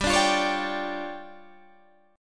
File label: D Minor Major 7th Double Flat 5th, G